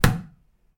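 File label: hit wooden pillar; knocking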